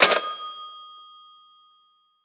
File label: Hang Up; blue archive